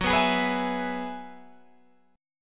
Gb 7th Suspended 2nd Suspended 4th Gb down Guitar Open G